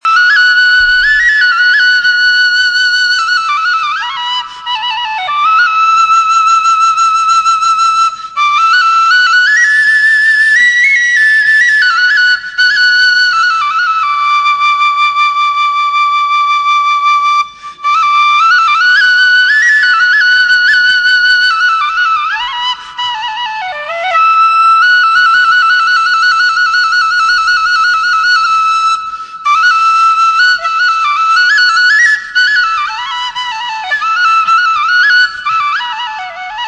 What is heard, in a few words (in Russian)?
Kuraj tatarskij nacionalnyj duhovoj, Tatarskaya narodnaya melodiya